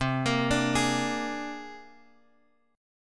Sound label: C 7th Suspended 2nd Sharp 5th C Guitar DADGAD